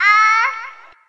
"ah", Voice

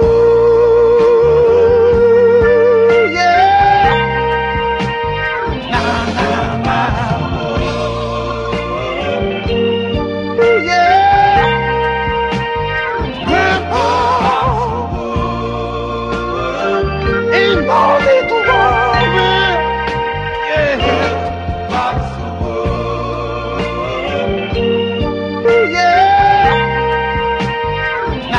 voice, loops, phonky

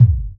Kicks

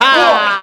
"ah", Voice

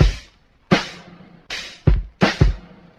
Drum loops Boombap

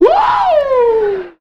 woo; flip wilson

